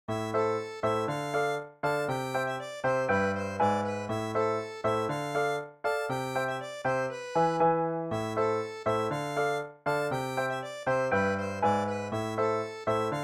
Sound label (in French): bourree; du zinzin simple